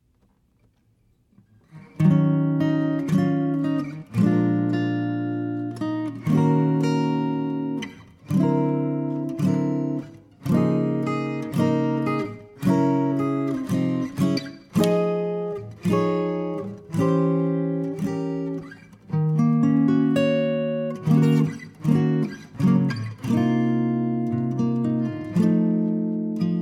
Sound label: guitar